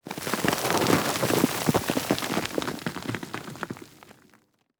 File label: impacts; World of Tanks